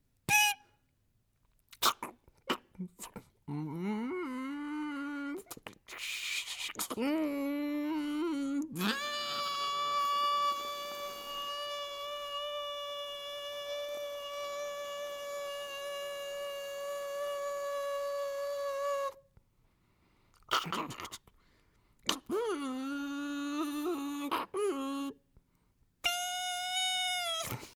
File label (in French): selection et distribution machine a café